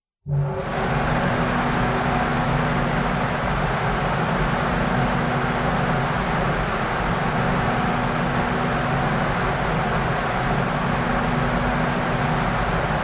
Industry Large Industrial Fan On Run And Off Electrical Mechanical; Tom Hutchings